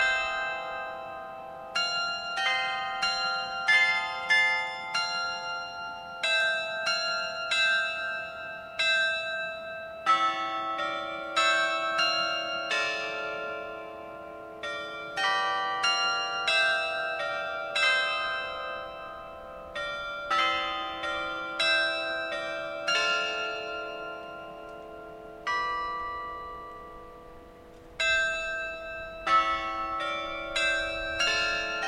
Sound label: bell; casals